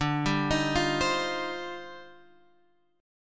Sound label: D sharp Major 13th, D, guitar chord